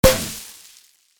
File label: Party popper confetti celebration